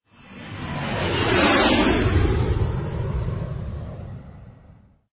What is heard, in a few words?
Jet Learjet Pass; Let It Fly Friday INTRO; Imaging